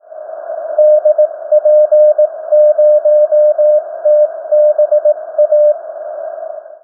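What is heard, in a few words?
TECHNOSAT, satellite; AIRSPY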